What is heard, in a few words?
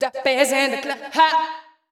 VOICE GRL HOUSE 125 A, House Voice